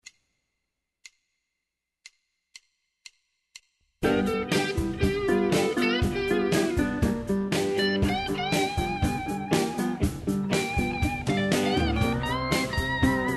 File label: Blues Playalong, Bass; Bass lernen Einführung